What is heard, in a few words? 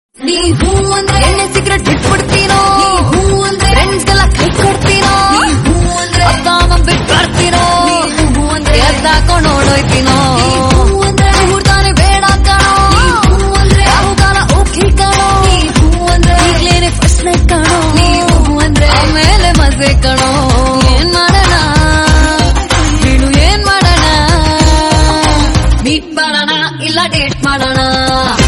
romantic kannada